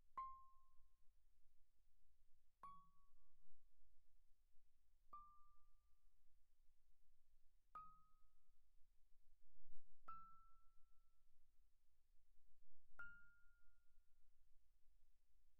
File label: Vibraphone dampen pp C6 F6; Percussion